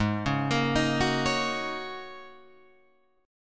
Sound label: G sharp 7th Suspended 2nd Suspended 4th G sharp Guitar Open F; G#7sus2sus4; 8,8,10,10,9,8; arpeggiated